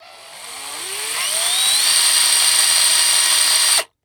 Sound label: Industry Tool Power Drill Short